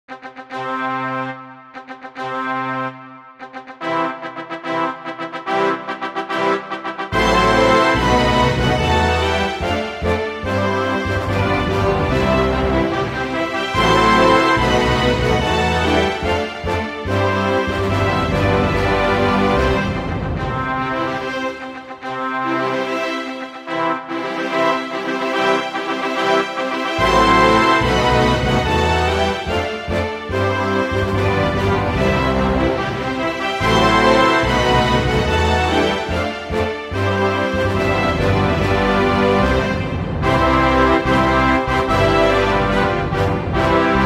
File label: brass minus